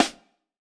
Snr, drum

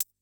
Elec Cl Hat, drum

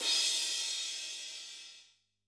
Crash, drum

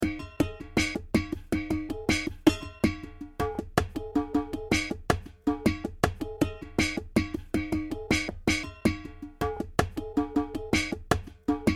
assorted cans

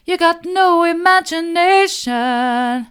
you've got no imagination soft, vocal

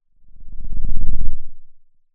Sound of a lion’s roar

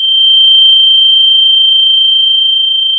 Sound of a tea kettle whistling

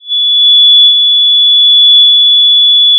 Sound of a police car siren

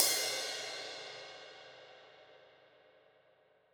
Trash F, drum

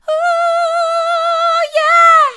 ooh yeah mid, vocal

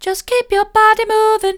just keep your body moving soft, vocal

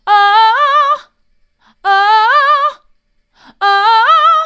oh oh oh hard, vocal

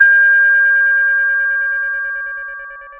pop, Sound of a church bell

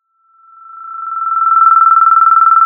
Sound of a phone ringing

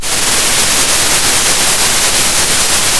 Sound of a thunderstorm

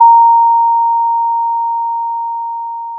pop, Sound of a doorbell

